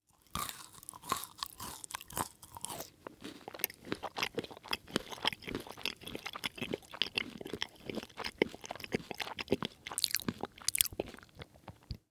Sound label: Human Male Chewing